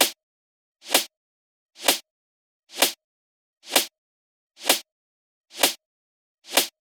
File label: mirror sd loop wet; Snare wet; JMG Sound